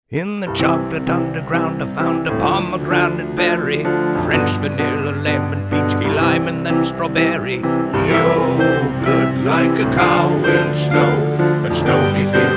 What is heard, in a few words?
Song Moo; Stonyfield